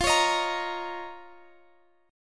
D sharp 7th Suspended 2nd F, Guitar

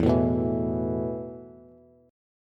E Suspended 4th E, down, Bass; Esus4 strummed

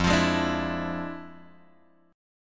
F Minor Major 13th, F; {1 X 3 1 3 0} strummed

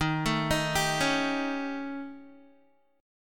Eb 7th Flat 5th Eb down Guitar; Eb7b5 arpeggiated; JGuitar

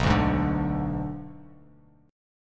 B Minor 6th B; Bm6 strummed; 7,5,6,7; JGuitar

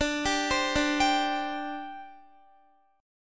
D 7th Suspended 4th, down, Guitar; D7sus4; arpeggiated

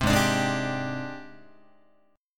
G sharp Major Sharp 11th, Guitar; G#M#11 strummed; 3,4,5,6,6,6; JGuitar

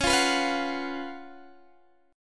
C sharp 11th C sharp, Guitar, Open G

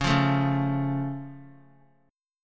Db Augmented, down; strummed; JGuitar

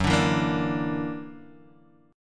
F sharp Augmented 7th F sharp Guitar Drop D

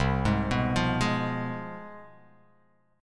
C Major 7th Suspended 4th Sharp 5th, down

